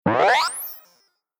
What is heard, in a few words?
Future Telemetry Glassy Short Riser Interface Delayed Space; Sound Design